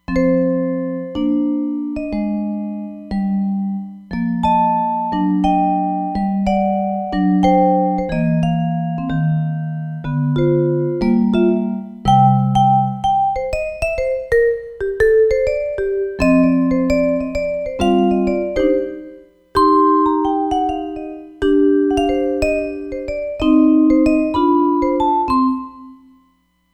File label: Vibe, Roland Fantom XR